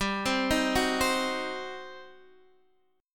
G Major 7th Suspended 4th Sharp 5th, G, down, Guitar, Open D